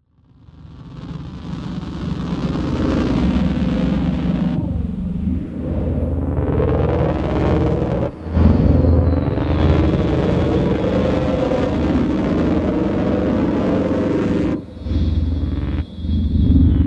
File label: Interference Field Transmission